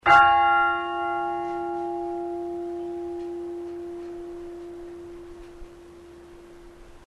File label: bell; merthyr